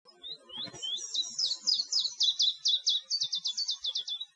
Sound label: call Start; Draycote